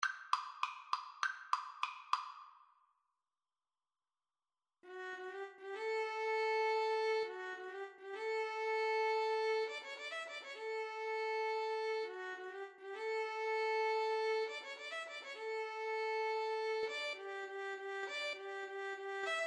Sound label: violin duet